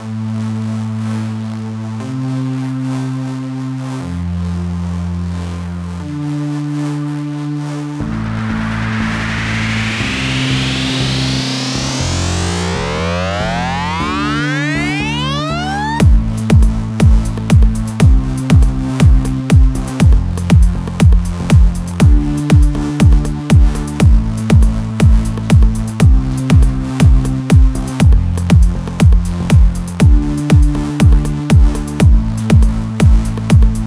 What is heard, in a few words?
Forest bass